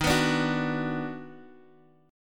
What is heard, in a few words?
Eb Minor Major 7th Sharp 5th, down, Guitar, Open D